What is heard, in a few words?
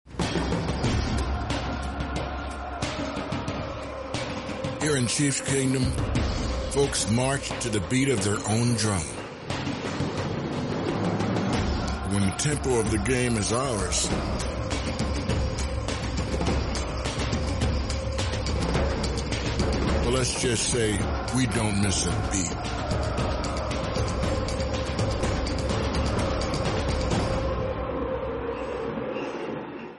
Beat of the Drum; chiefs